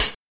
light pedal hi-hat sound e key, hat sound sample tuned to the E note